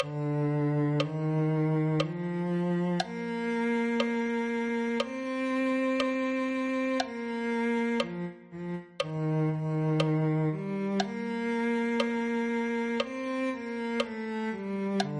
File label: cello